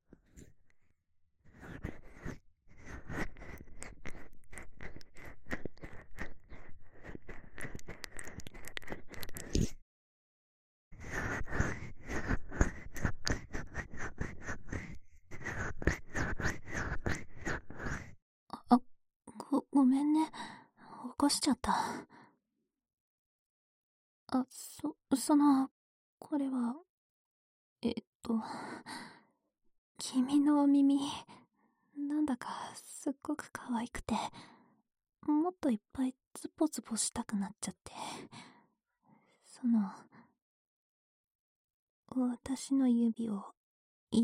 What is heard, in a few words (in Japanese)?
指耳かきパート(反転、雨音なし); 陰キャな先輩は耳かき大好き